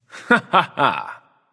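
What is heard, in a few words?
nonverbal heh heh; smite; voicepack; merlin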